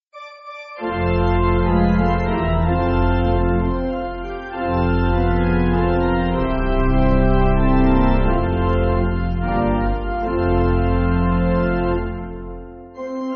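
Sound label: Pipe Organ, Music Backing; I Know The Lord; Lillenas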